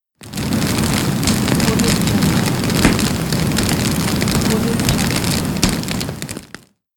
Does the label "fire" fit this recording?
Yes